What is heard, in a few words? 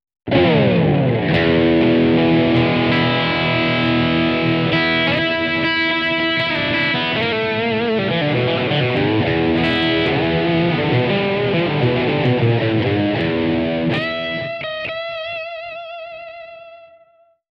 bypass; Maxon VJR9